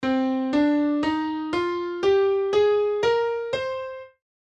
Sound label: Cm scale